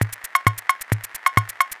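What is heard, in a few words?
Lo Fi Machine Loop 132, Lounge Drumz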